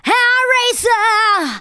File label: VOCALS SUNG; Techno Trance Essentials